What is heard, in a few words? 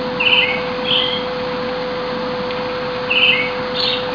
akahara; haruna